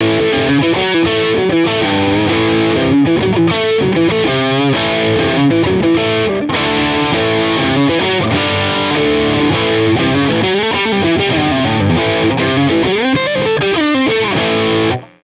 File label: OD820 Over Drive Pro, pedales